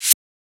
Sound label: Shaker; FLPRO